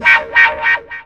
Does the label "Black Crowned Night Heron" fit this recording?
No